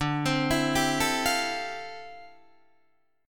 D Minor 11th D down Guitar DADGAD

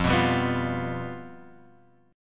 F Suspended 2nd Flat 5th, down, Guitar, Open G

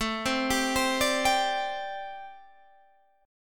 G Suspended 4th A, Gsus4/A chord; arpeggiated; JGuitar

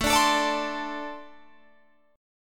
A-Minor Double Flat 5th A Guitar Open G

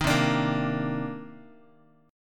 C Minor 9th C Guitar; Cm9 strummed; JGuitar

